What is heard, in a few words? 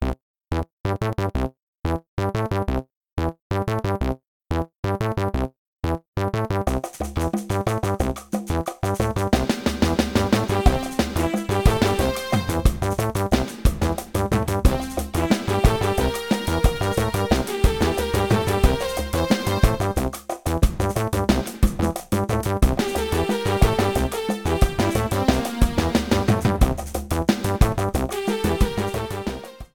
Final Boss